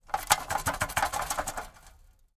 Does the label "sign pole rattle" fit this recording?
Yes